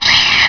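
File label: squeak hunt, ambience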